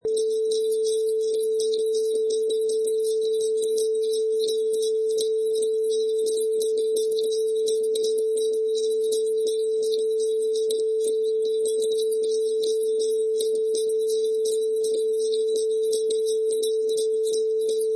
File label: Jingle Bells